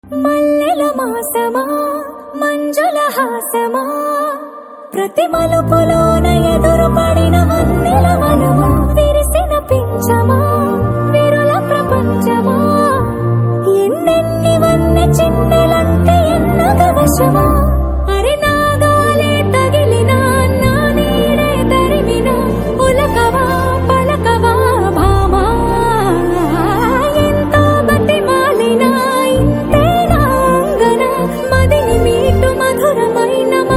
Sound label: Female Telugu Ringtone